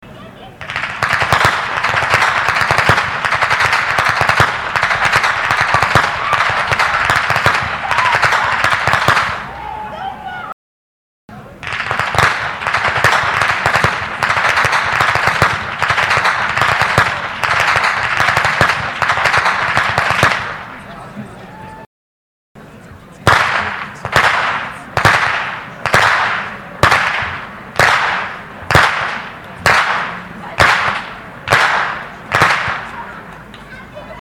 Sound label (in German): Schnalzen; Feldkirchen; Ainringer